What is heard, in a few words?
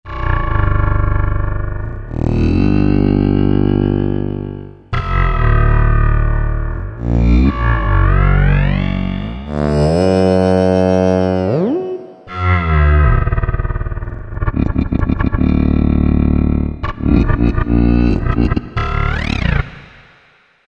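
Bass, Roland V-Synth; Factory Patches; Sleeping Bs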